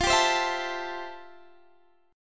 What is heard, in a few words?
A-Minor 7th F; Am7/F; strummed